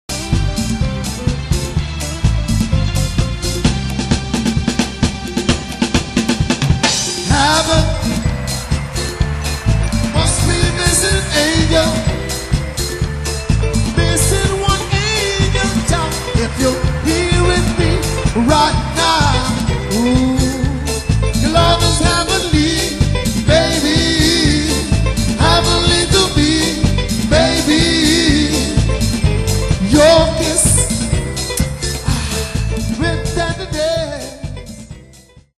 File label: Exobeat